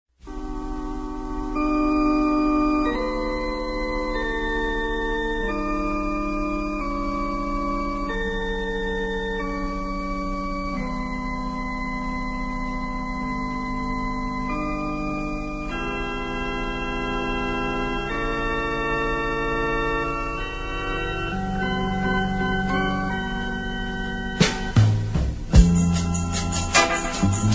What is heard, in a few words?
Whistle; Instrumentais; Free Sound Orchestra; Loves Whistle